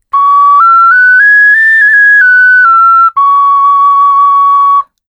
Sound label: PreColumbian 4 hole animal jingle, ocarina